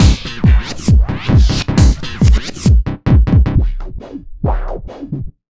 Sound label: Big Beat Drumloops, 135